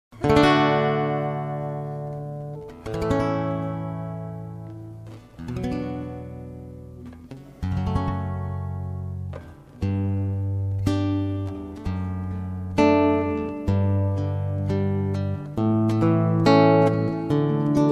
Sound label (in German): Pachelbel Harfe